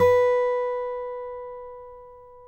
COLLINGS B 2, Guitars; Spectrasonics; Hans Zimmer Guitars VOL-2; FNGSTYLE PFM

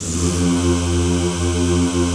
ZZZ F1, Classical Choir; Best Service; Extended Classical Choir

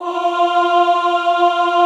OHH F3, Classical Choir; Best Service; Extended Classical Choir

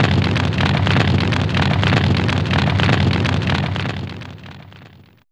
Crackle, Fairlight CMI IIe

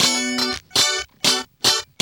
GUI 120 BPM, Roland

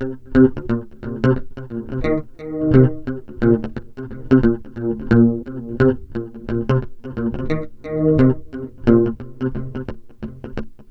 FUNK 88, Bass Grooves; USB Soundscan vol.30; AKAI

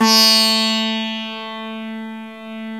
SITAR A3, Indian Ensemble

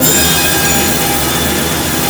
G#4 FIRES; Northstar; The Wizard VOL-1; SCORPION

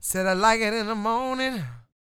SING W, Vocal Planet Blues & Soul, BLUESY; ILIO

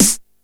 snare, Yamaha RY-8

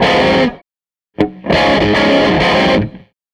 Tele Guitar Loop; Sonic Foundry (Sony Creative Software) - Six-String Orchestra

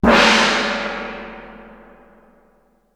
GONG, GONG CYMBAL

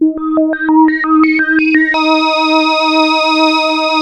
PPG G#4; Hollywood Edge - Giorgio Moroder Rare Synthesizer Collection; PPG.2.3

